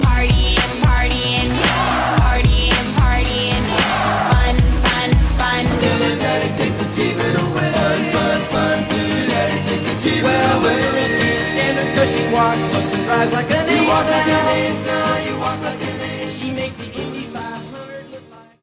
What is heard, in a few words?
RINGTONE